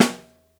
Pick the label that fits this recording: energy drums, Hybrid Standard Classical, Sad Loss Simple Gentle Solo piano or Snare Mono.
Snare Mono